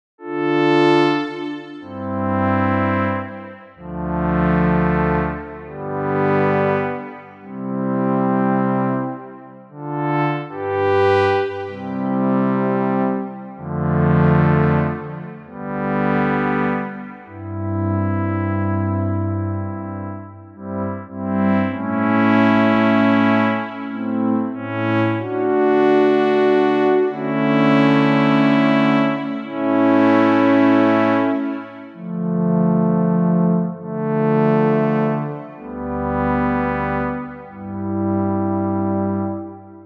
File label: saw wave and choir; the digital life